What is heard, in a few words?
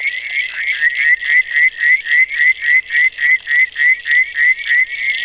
Litoria verreauxii